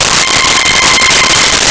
Strings C6; Nintendo; July 2020 Gigaleak

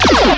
energy; Enemy SE; Nintendo; July 2020 Gigaleak

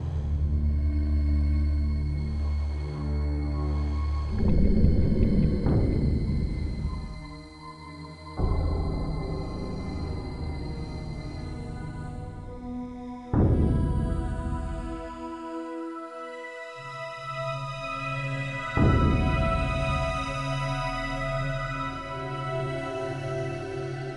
spooky, loop

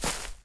grass run, footsteps; chief

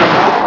accel on; Nintendo

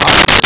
chick

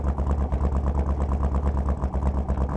hotrod idle, vehicles; warthog; loop

